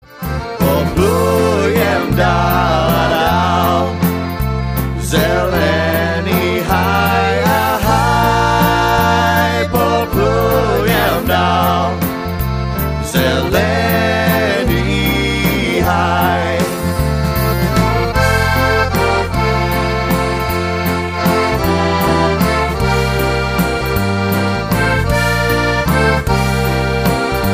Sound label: Waltz